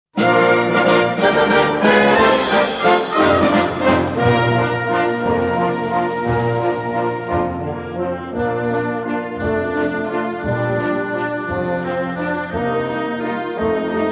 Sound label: Bavarian Schneewalzer